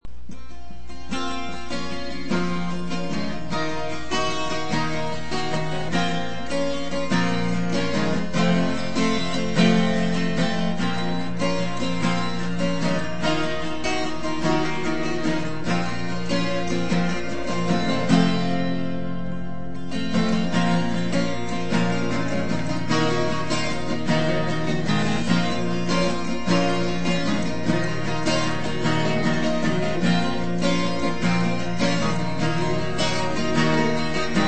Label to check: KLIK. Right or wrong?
Wrong